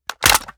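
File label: foley assault rifle akilo47 reload empty mag hit; weapons; viper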